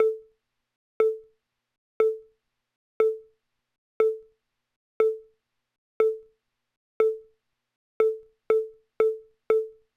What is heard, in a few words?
Timer 1 sec, Camera; Motorola V14W